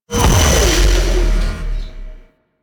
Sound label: Lair Gravity Stream Start; Warframe; Sentient Lair